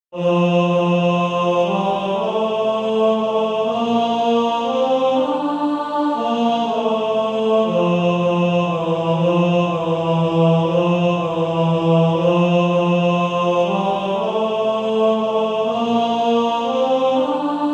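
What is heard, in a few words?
Male Choir, tenor 2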